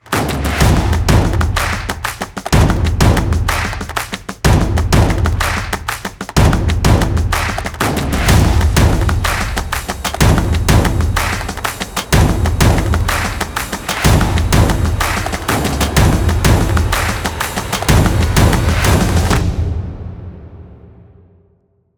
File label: Military